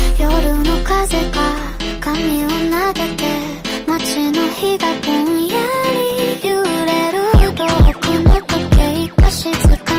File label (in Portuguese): montagem